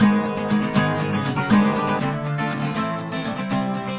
big guitars strum strum strumming; SYNTH AND ELECTRONIC LOOPS

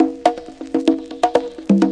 solid classic conga, drum loops and breaks

